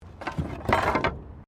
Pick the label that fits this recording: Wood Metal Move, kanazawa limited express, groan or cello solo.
Wood Metal Move